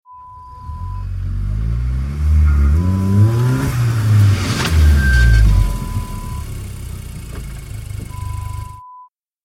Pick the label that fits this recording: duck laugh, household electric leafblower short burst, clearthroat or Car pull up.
Car pull up